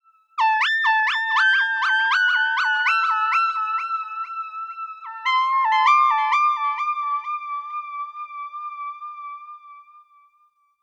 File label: Pop Moog Line A; Pop Rock; Pro Tools Essential Demos; Digidesign; Essential Loop Demos; Demo Session - Pop Rock